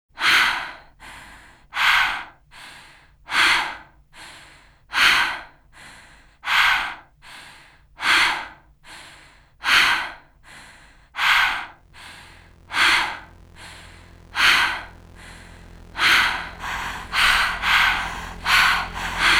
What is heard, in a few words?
Vacuum